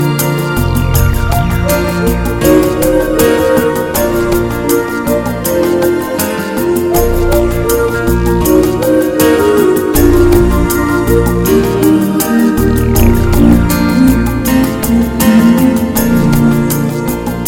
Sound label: Beautiful Soft Song